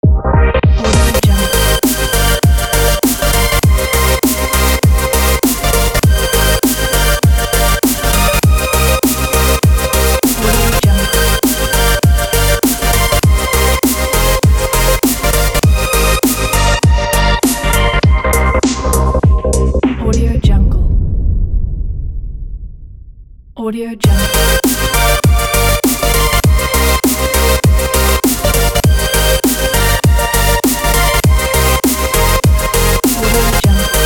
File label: glitch hop beat logo